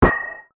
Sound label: Metal Impact